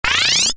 Maximise, Effect To Accompany Maximizing Of Window Or Program; Transitions; Multimedia